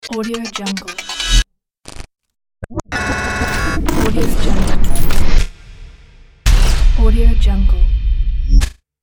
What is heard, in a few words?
glitch logo